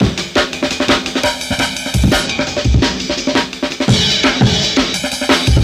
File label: 170 Break, Loops; M8